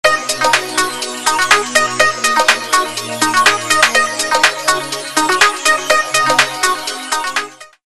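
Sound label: ringtone, Motorola A3100; vermilion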